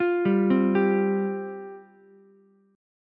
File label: C Suspended 4th C, Ukulele; Csus4 arpeggiated; 10,7,8,10; JGuitar